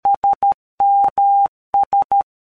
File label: Sos Morsecode